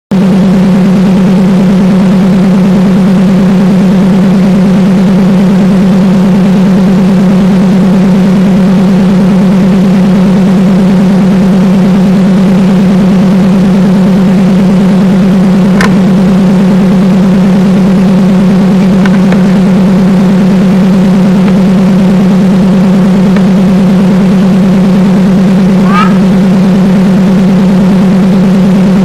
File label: Morning Noise Broadcast